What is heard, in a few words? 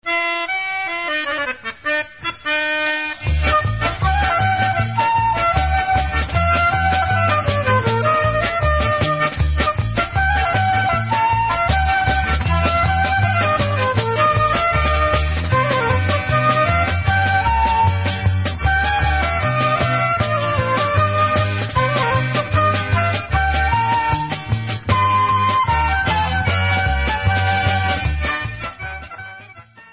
Instrumental